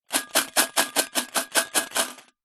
foley money collection tin coins inside shake; zapsplat

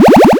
leisure video game retro 8bit power up

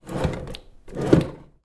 household small wooden unit drawer open close

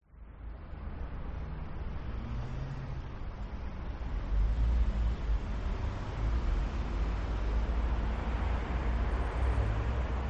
City Medium; audio hero

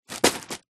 food wrapped loaf of bread down on surface; zapsplat

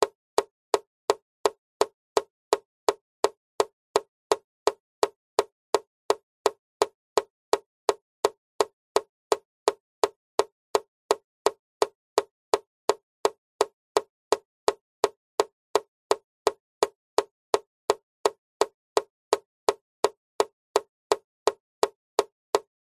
nature water drips steady fast plastic hollow

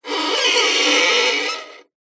musical violin awful bow scrape nasty

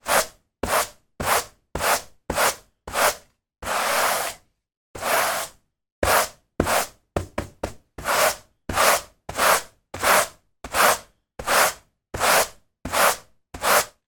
broom sweep carpet; household; zapsplat

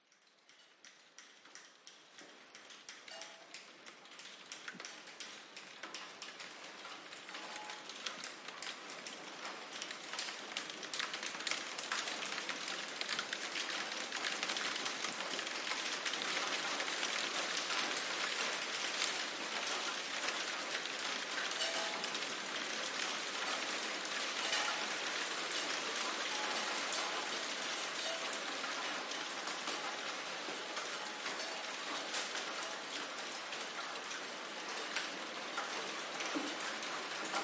Rain Drippy Pouring Downpour; ORTF; PCMD100; PMSFX

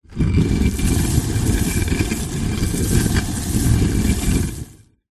stone door tomb drag slide dust debris fall; foley

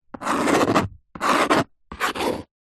household knife scrape plastic chopping board

